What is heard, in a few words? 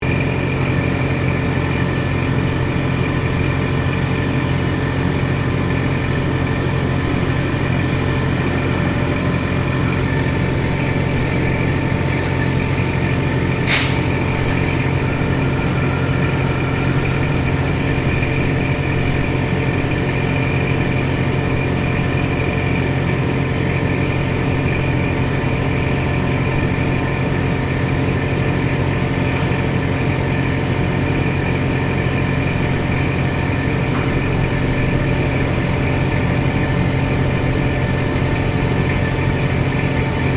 Industrial Waste Management Truck Pump Operating Loop Stereo; Industrial waste truck pump operation; Machinery